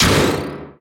Rocket Kick; Samus

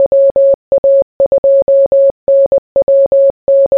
Morse Code

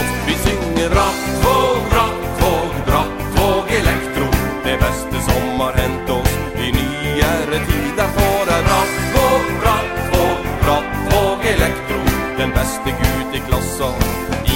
Electro

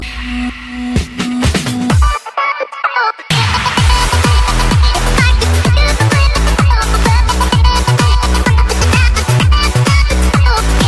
Cool Electronica

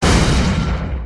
Lazer Gun

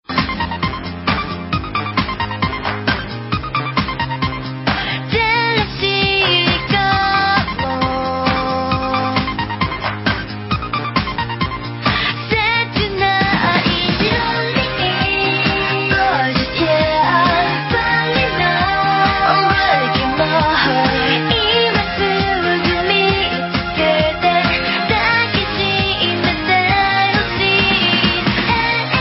Japanese Song

